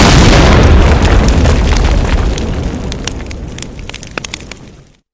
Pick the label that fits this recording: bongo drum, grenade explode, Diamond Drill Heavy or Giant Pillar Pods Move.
grenade explode